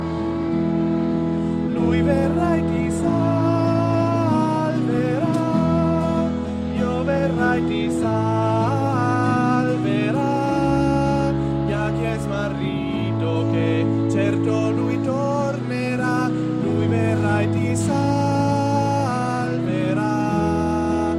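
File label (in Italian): tenori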